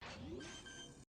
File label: Spike Plant; Valorant; Soundpad